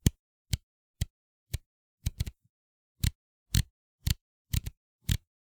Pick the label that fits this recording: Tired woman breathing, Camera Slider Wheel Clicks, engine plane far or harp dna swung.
Camera Slider Wheel Clicks